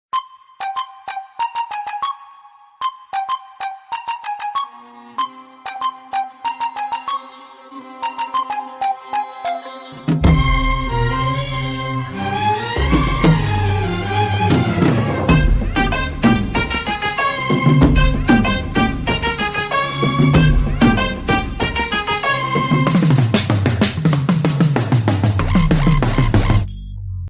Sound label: Ringtone